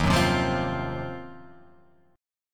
Eb Minor 6th Add 9th, Guitar Open F; Ebm6add9 strummed; 3,1,0,0,3,0; JGuitar

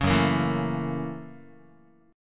F sharp 7th Sharp 9th, Guitar; F#7#9; 4,2,1,2,1,x; strummed

